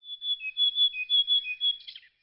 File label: bird